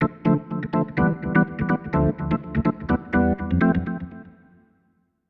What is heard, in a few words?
ORGAN VOCAL 125 A; Keys